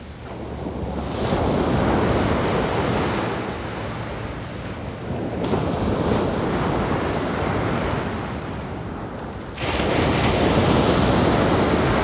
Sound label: Beautiful Bird Song